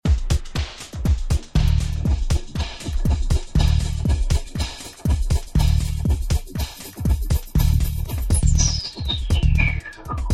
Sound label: house riff